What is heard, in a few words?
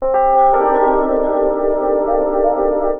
SYNTH D+B 160 C, Drum+Bass, Keys; eJay